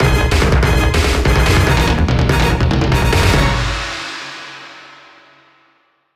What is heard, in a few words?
Jingle Huge Complite